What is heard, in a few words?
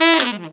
TEN ESF, Phantom Horns TENOR FX; Zero-G